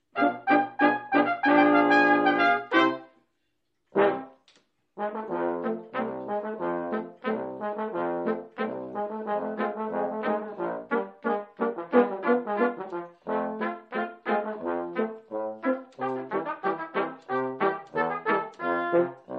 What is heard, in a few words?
Bluegrass Brass